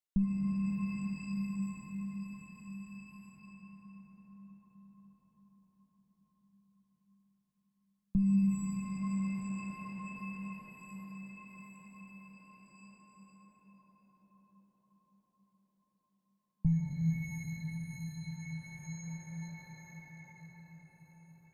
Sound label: Glass